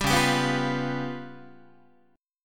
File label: Db 7th Flat 5th Guitar, Db7b5 chord; Db7b5 strummed; JGuitar